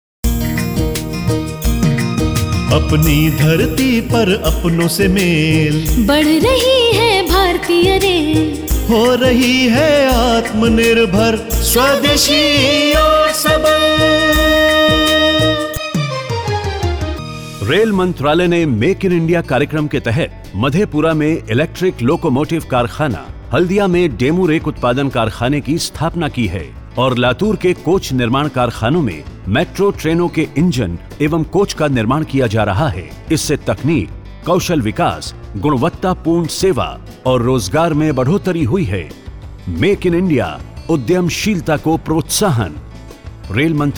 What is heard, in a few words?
Jingle; Make in India; Indian Railways